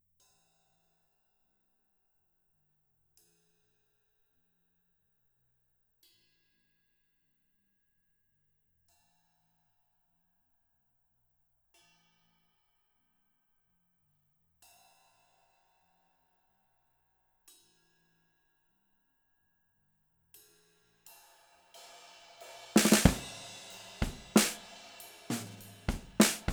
PGA98 SNR Drums

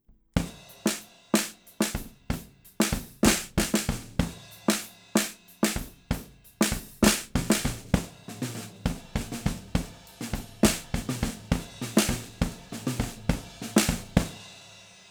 Drums (Inverted); PGA98 SNRb

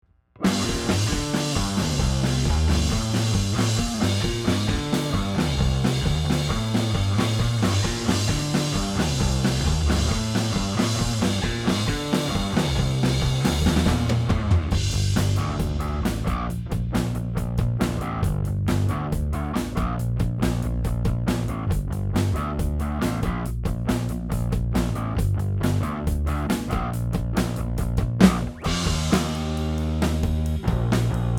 Drums and Bass

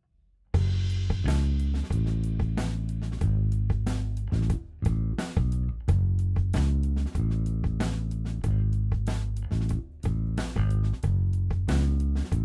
Drums and Bass Post Bass Comp

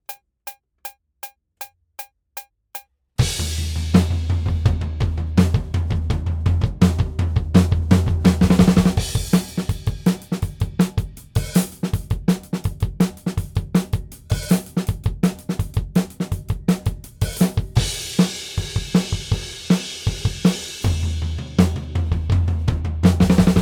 Drums ONLY; Pre Pro

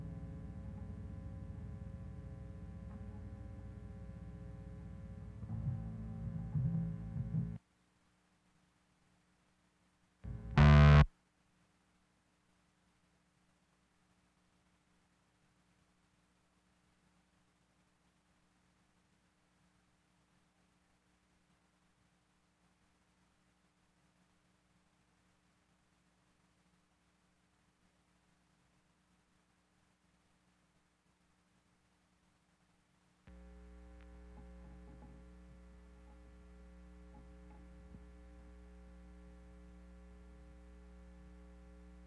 DI Line Bass

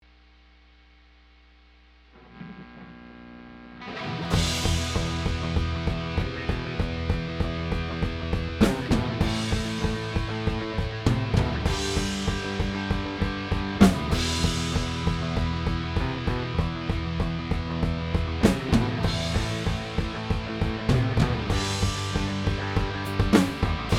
Drum Stems

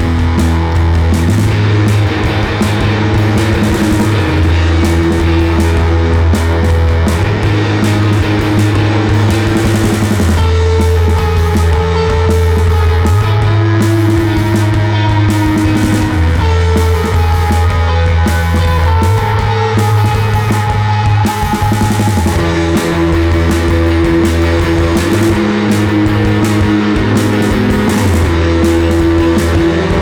2 Toms

